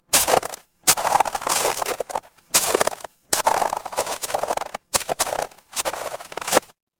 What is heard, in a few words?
footsteps snow